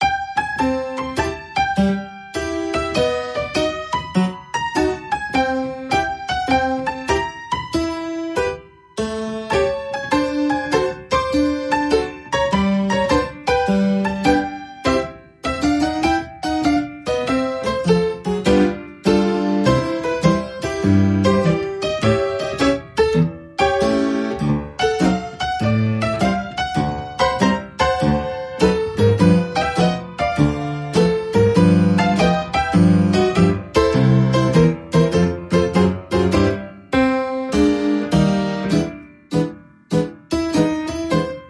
Saloon Piano

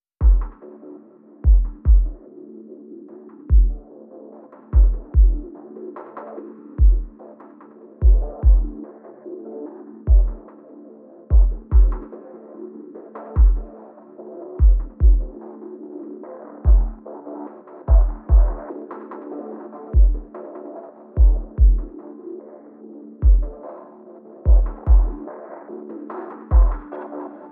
Ambient

